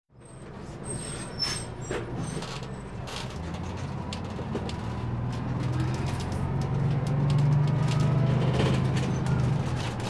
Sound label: Bus ride on board, New York City; Sounds Visual; The Sounds of New York City